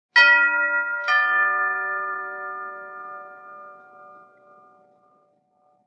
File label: Door Bell Tube Bell Ch